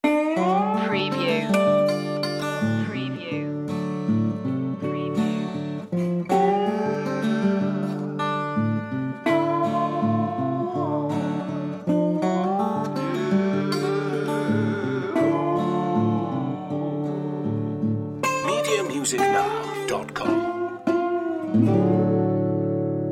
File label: soul broke blues